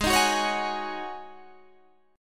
Ab 7th Suspended 4th Ab

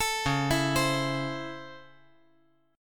C sharp Minor Major 7th Sharp 5th C sharp, chord

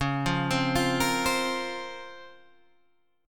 Db Major 13th, Guitar Standard; arpeggiated; JGuitar

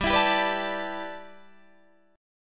G 6th Add 9th, Guitar, Open D

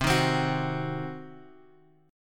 C Diminished, Guitar Open F, chord; strummed; JGuitar

